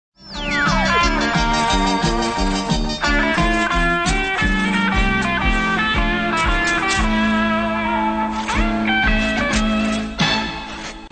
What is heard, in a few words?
Background Synth